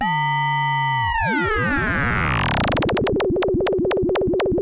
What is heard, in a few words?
Moog C2; Hollywood Edge; Giorgio Moroder Rare Synthesizer Collection; MLTI.MOOG